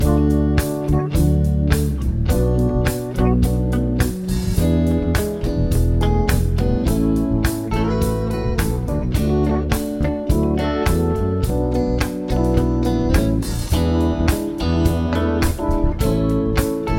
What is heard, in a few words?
progs with applied chords